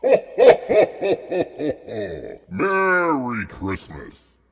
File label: Retry Jingle; Friday Night Funkin' vs. Santa Claws OST Windows gamerip 2021